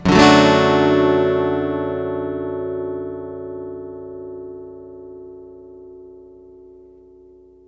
One Shot Guitar - 125 BPM (A Major), Acoustic Guitar; Instruments; One Shots; GhostHack; Ghosthack - Free Guitar And Piano Samples